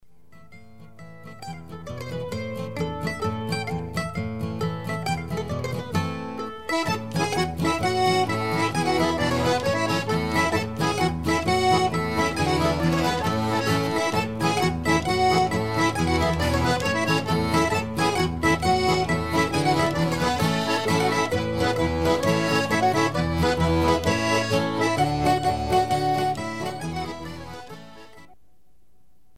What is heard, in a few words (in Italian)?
tarantella